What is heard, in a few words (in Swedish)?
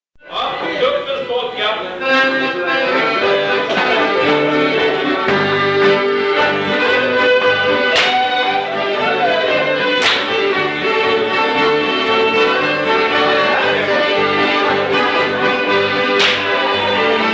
tuppens polka